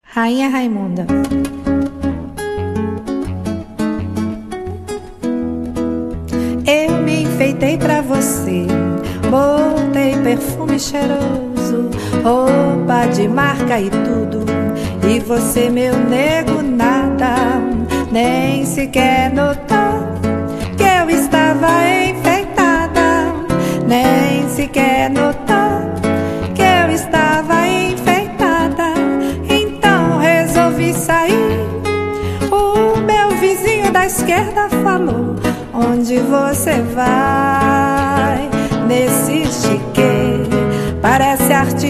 samba

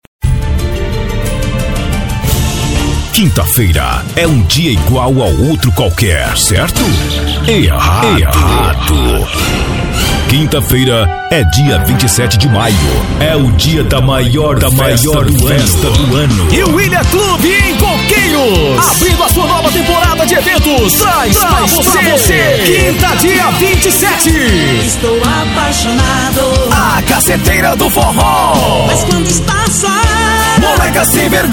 festa forro

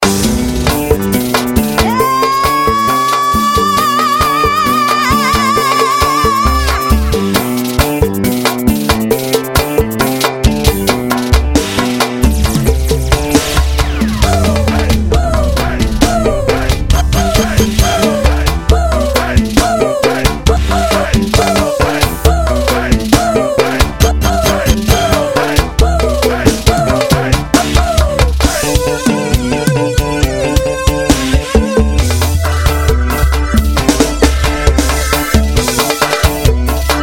samba das antigas

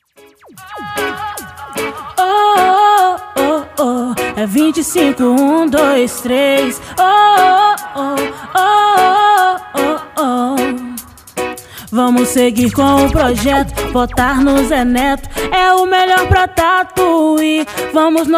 jingles políticos; eleição 2020; Zé Neto Pesadão

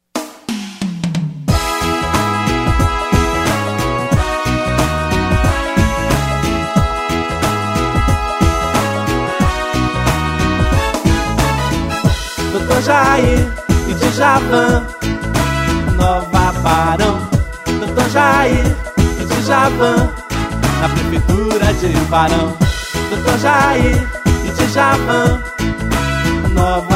jingle estilo reggae; dr jair; martino campanhas

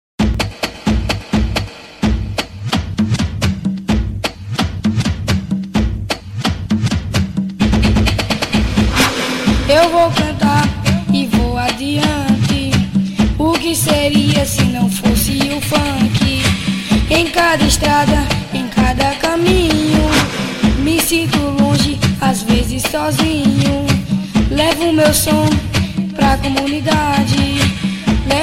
funk

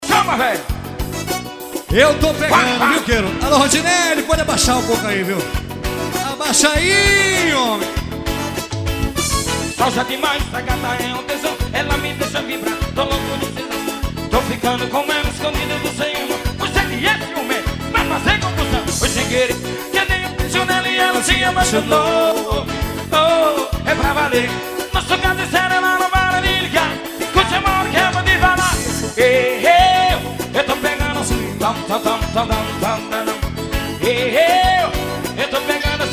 forró ao vivo

